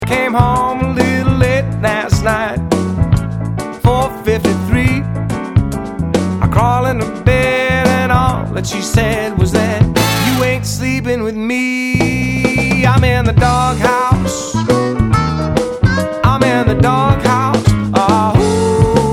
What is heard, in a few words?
dog house blues